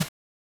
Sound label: brush; Mistergenerator